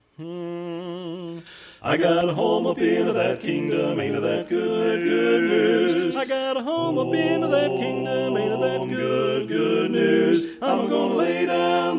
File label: Ainta That Good News Baritone